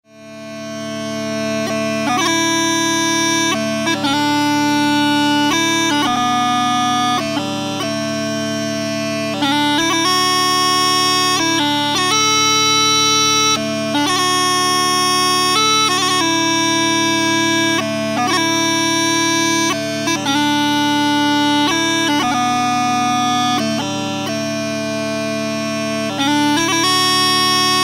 on Small Pipes; Wedding bagpipe music

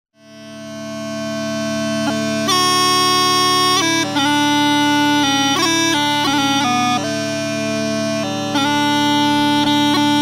The Rose Of Allendale on Small Pipes; Wedding bagpipe music

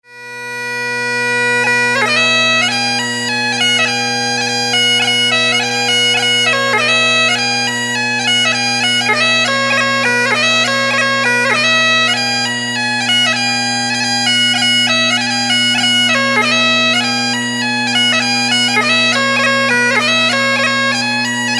Highland Laddie, bagpipe; Wedding bagpipe music

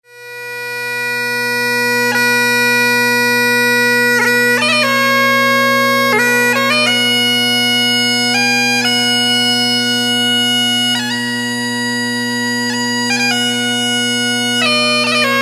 The South Uist Whaling Song bagpipes

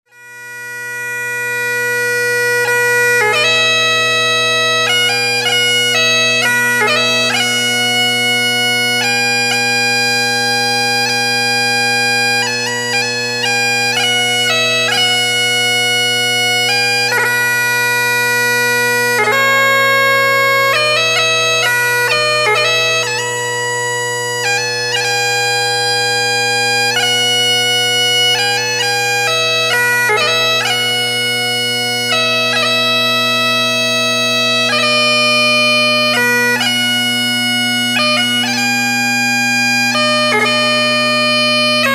bagpipes; Wedding bagpipe music

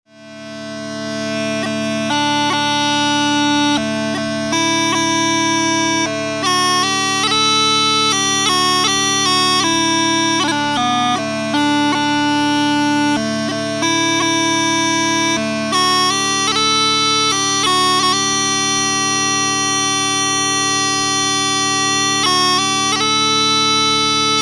on Small Pipes; Wedding bagpipe music

